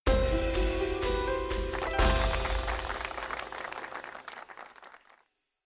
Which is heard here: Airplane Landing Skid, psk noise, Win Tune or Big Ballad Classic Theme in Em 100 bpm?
Win Tune